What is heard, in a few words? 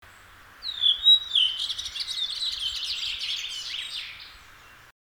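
Turili, Redwing (Turdus iliacus); 13.6. Tali. Kuin F-H.; Espoon - Helsingin raja-alueilta alkukesästä 2008; Linnut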